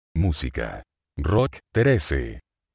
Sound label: rock